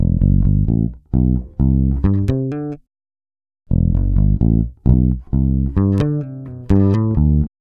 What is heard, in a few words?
bass guitar loop unprocessed 130 E; attitude